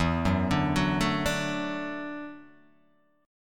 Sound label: E 7th Suspended 2nd Suspended 4th, down